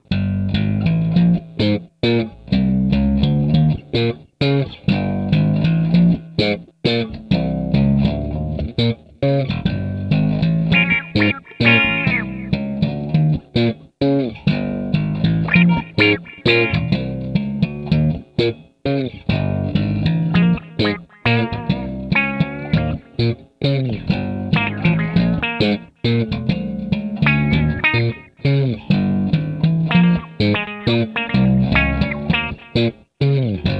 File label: funk, guitar